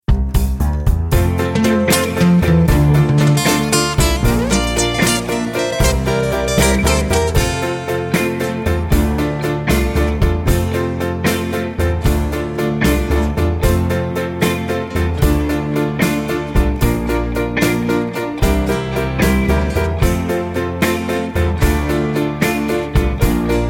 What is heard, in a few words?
F No Backing Vocals, backing tracks